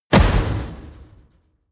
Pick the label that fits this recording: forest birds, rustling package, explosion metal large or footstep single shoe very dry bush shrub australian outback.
explosion metal large